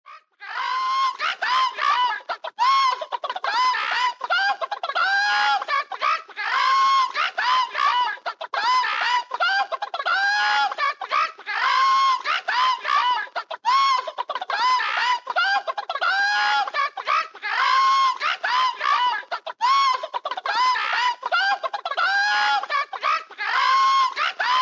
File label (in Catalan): gallines